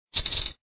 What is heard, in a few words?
chip